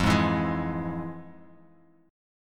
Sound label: F Minor Major 7th, chord